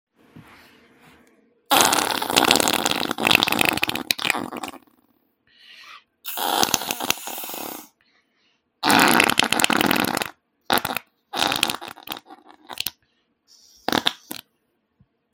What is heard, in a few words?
fart